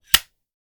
Extinguish the flame on an oil lighter

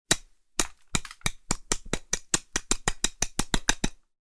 Crushing ice with an ice pick

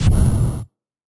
fire magic small; 魔法/法术的音效

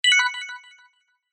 cancel

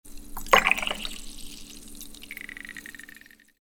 Pour the cocktail into a cup

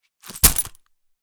Coin Purse; game inn

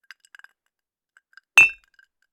Glass with ice Cheers

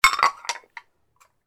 Plate sound

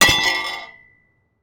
Metal; game inn

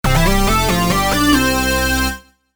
game clear

